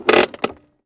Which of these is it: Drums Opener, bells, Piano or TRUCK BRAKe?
TRUCK BRAKe